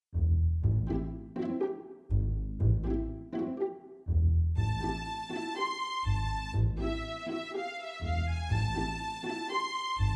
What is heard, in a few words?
TANGOED for str orch or str quintet